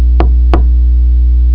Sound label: knock